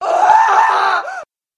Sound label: monster cursed scholar